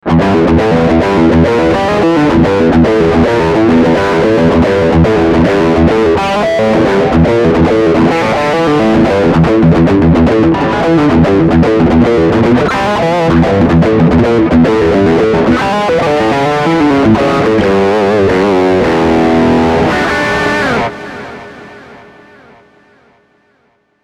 SL Drive

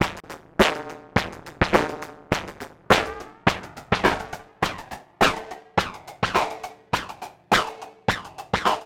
Waldorf 2 Pole Drums LFO HF Sweep

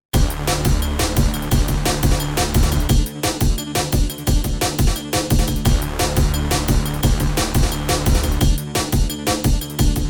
Juno X Cyber Bass

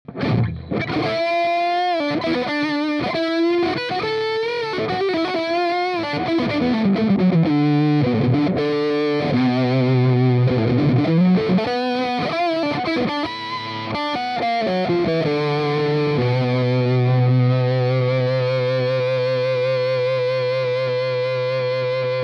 Bridge HB Solo

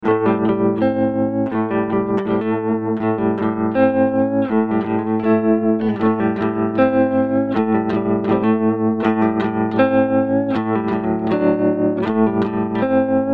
tremo´blues

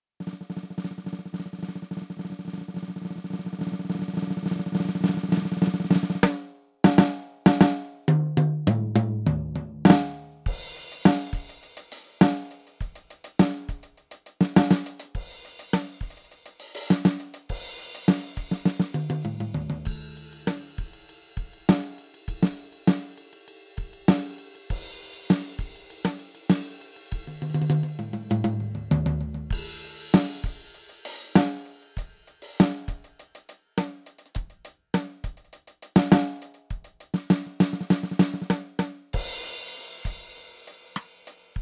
Kit Coated Medium; EFNOTE 3; Kit 5

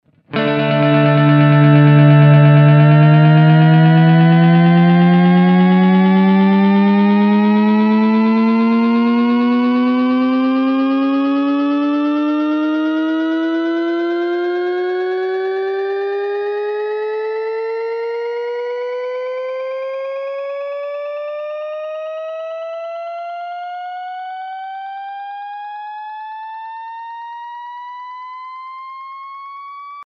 Eventide MicroPitch Riser; Eternal

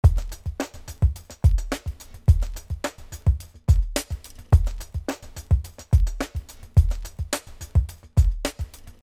Drum loop M S Breiter

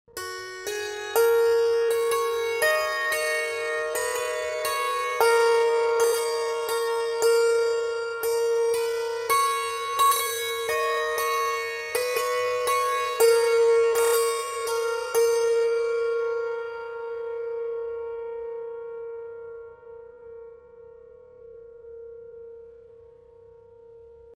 santoor 60bpm +6 semi

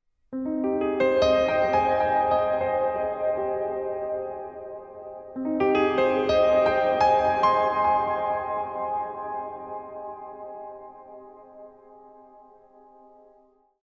Piano Gothic; Noire; Softube LC48

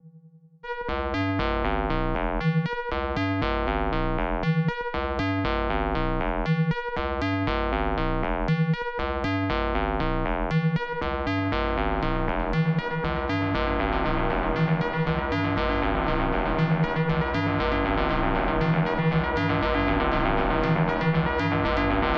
Drum Machine; Boss DD8

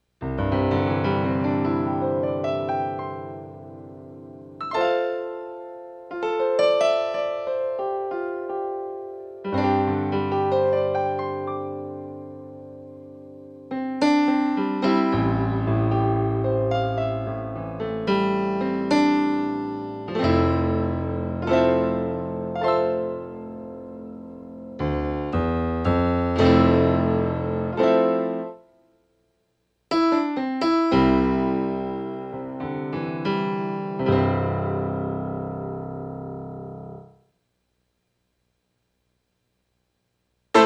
Piano

